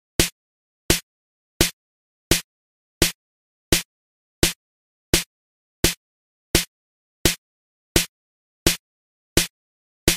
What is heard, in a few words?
Race - Metronome; Underhero